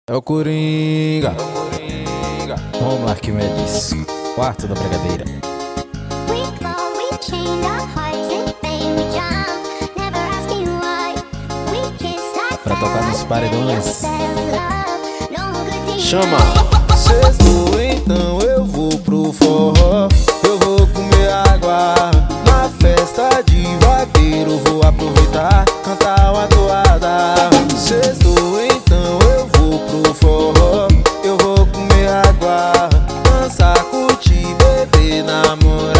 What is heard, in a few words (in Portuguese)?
eu vou pro forro